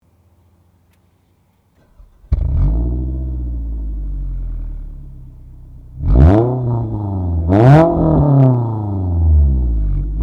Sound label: Scion tC